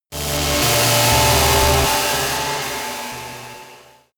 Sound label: sci-fi cosmic dark energy disturbance; horror